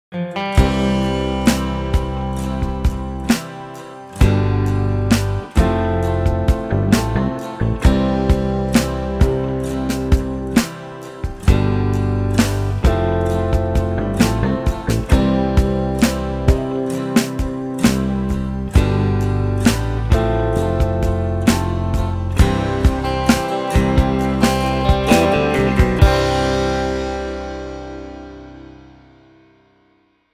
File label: instrumental